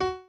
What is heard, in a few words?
piano chord